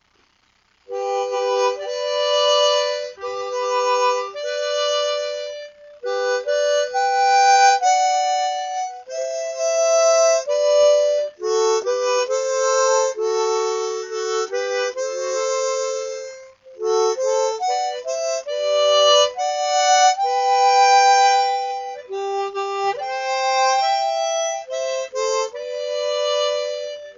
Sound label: Brahms Lullaby, harmonica